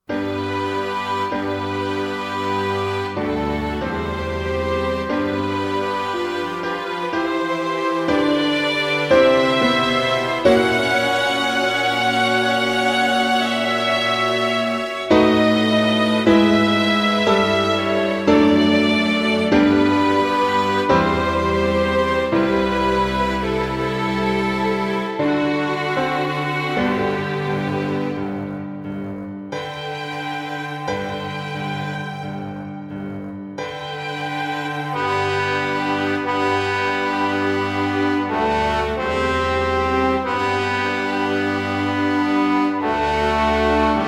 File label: trombone; album in trombone